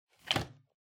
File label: Calendar Hang